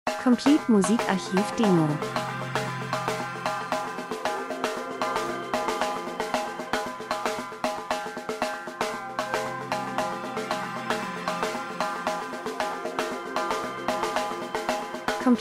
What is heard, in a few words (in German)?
drums, rhythmus; Rhythmus geheimnisvoll Spannung Klangfläche Welle Gleichförmig Monoton Akzente Unterwasser Suche Spielfilm TV